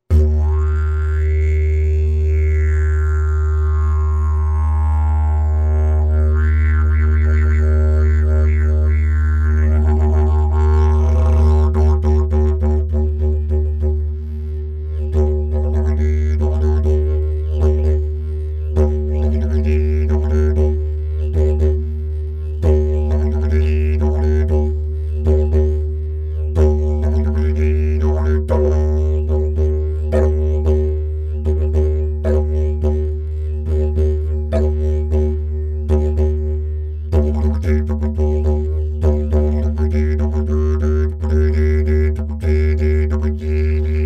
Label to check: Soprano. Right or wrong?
Wrong